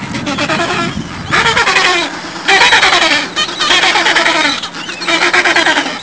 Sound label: adelie penguins, Antarctica